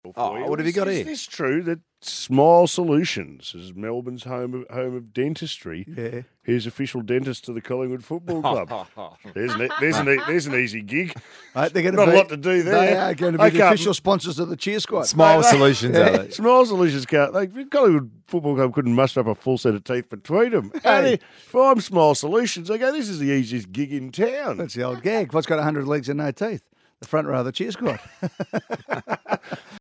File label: Cheer Squad; Pies